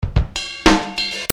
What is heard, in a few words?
Beat